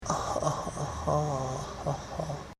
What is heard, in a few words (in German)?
lach flach